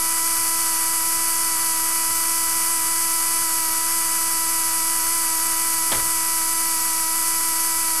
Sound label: motor